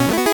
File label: 8bit se